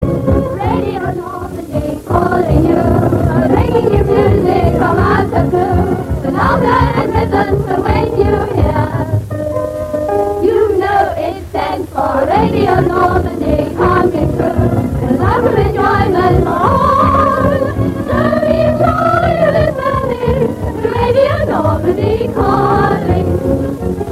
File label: jingle; Radio Normandy